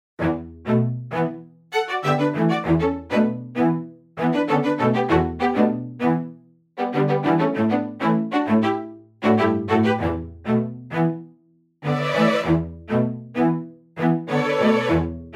dry; mir pro 3d

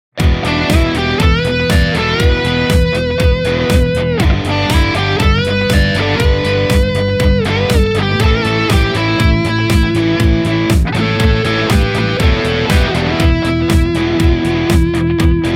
drive cable

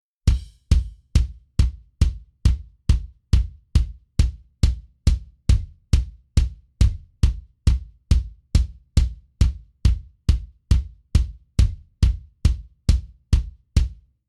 layered sound kick live

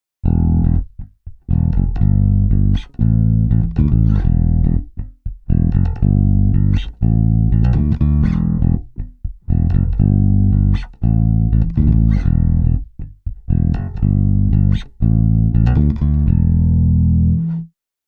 mic pre with rndi

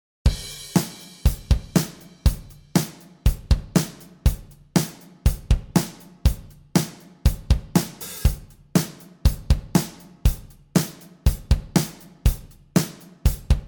sd3 bfd3 2mix

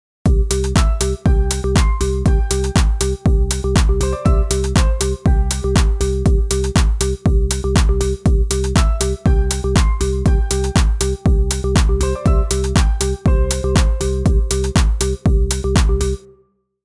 ssl fusion trans 2mix on

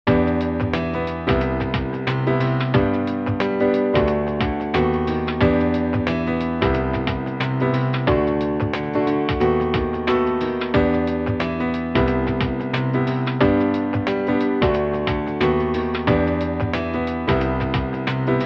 ivory2, unprocessed

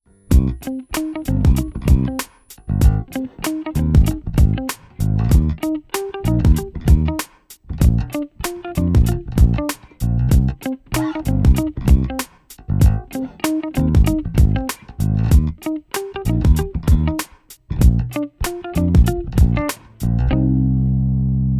bass cable; qac-222g

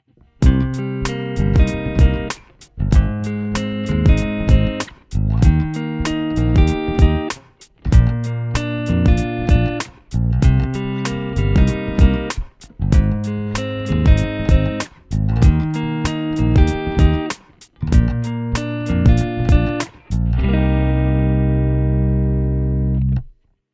gt clean cable arp 2mix

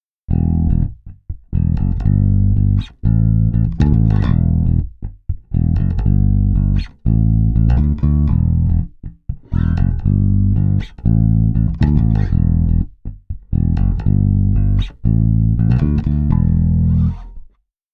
interface with rndi

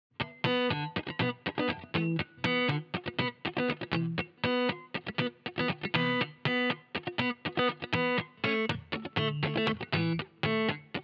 funk; gt drive cable

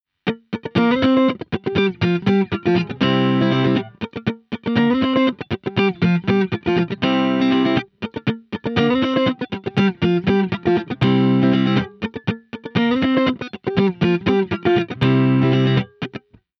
rme comparing ucx2

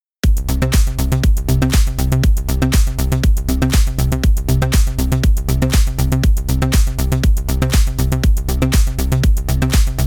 comparing 2mix; rme; ucx2

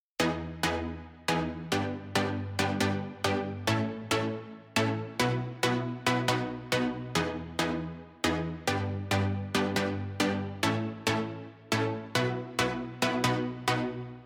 layered sound synth pluck

